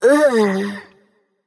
ugh; smite; hel

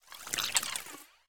arachne spider egg idle; smite

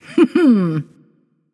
"heh heh"; smite; voicepack; nike

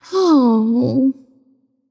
nv sigh; smite; voicepack; morgan le fay

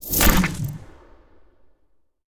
webground fire; arachne; smite; arachne skin9

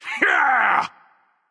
"yay"; smite; voicepack; poseidon

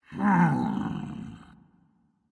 thinking; Smite; bakasura

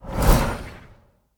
whoosh; smite; god tiamat

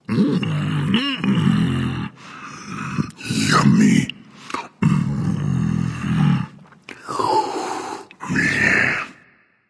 content, voice; Smite; ymir skin14

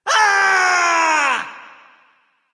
battlecry; smite; nezha

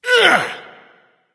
grunt lift; Smite; bacchus

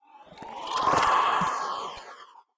wraith; smite; ahpuch skin10

